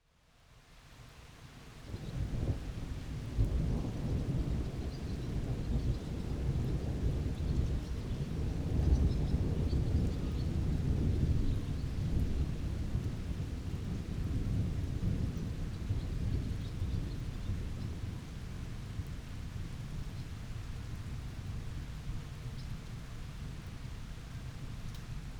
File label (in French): Orage